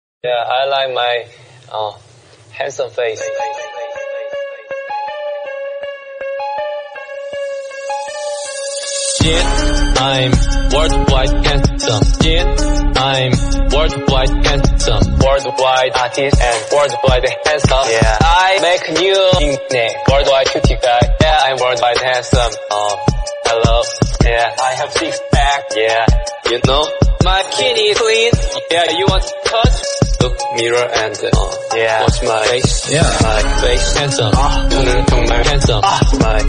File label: english remix song